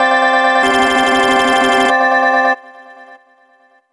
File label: ringtones; HTC; One-M7